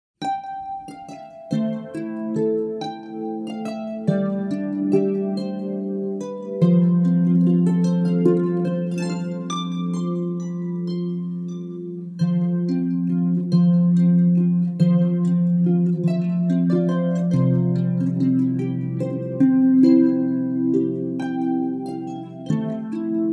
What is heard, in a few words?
harp